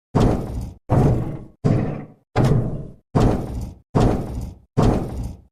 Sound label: nosey walking